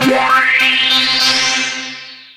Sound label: Horn Sect Effect